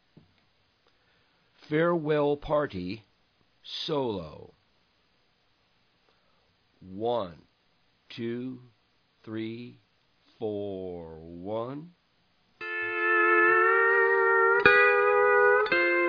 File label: solo slow